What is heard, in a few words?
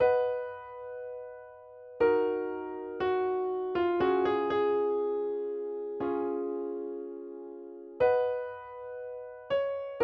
instrumental Db